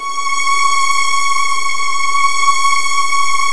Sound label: C#3, Virtuoso Strings; ILIO; Kirk Hunter Virtuoso Strings VOL-1